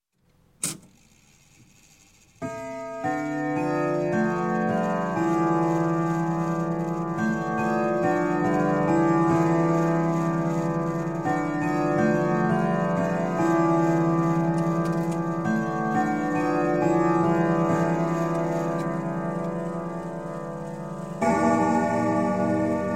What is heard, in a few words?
Clock, Grandfather Winchester Chime - Strike; Strike Eight; Sound Ideas 2000 Series